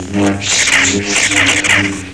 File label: saber; sound effect